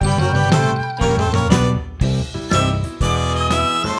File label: strolling music